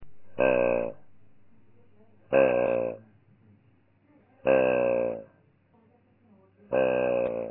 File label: grunt